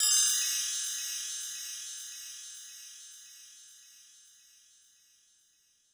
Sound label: Percussion, Korg 01W; 300 Drum Machines